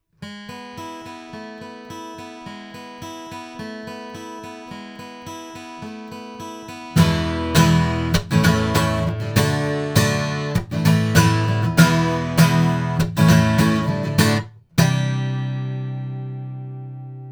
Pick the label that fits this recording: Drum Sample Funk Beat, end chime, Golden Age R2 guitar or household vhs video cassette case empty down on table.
Golden Age R2 guitar